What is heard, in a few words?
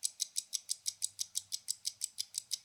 Clock; Blind Survivor